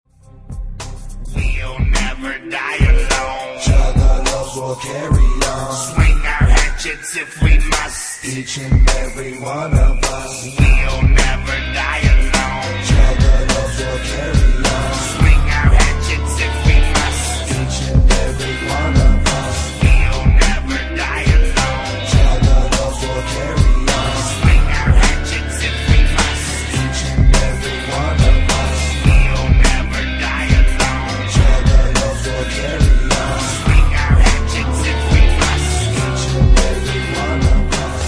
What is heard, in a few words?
juggalo chant